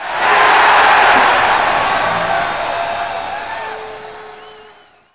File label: cheer, crowd; Splashdown; XBOX